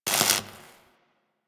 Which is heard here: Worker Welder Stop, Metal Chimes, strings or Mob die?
Worker Welder Stop